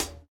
Hot Hat Sound D#, hi-hat tuned to the D# note. Loudest frequency: 4670Hz; hat samples